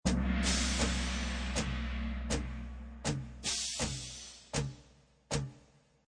Loop orchestral cymbals toms 160, Orchestral cymbals and toms 160 bpm.; Percussion Loops